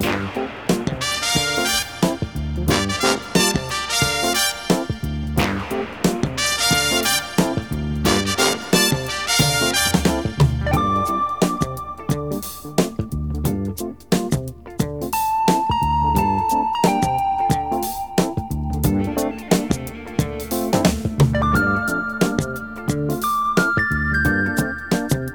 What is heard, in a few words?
full fonk, Loops Phonky